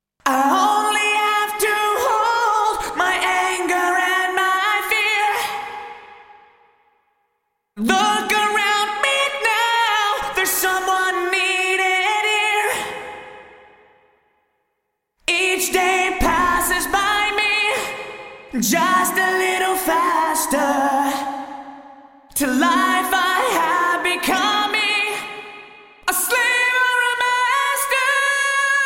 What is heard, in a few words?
Korg A1 Vocal Smooth Hall